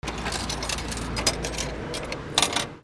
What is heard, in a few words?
PHONES